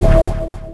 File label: cancel